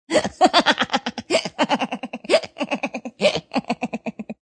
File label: Laugh Hic, Female laugh, comical, very nasal, (human voice); Laughter